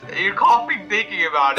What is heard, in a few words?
coughing